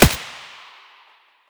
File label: svu muzzle mono distant, weapons